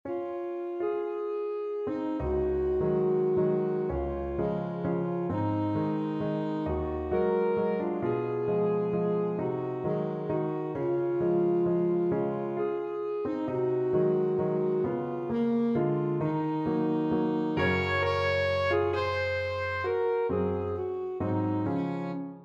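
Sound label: emperor waltz alto saxophone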